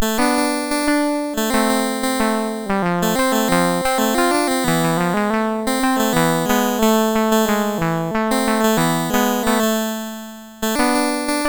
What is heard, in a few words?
sid MOS6581R4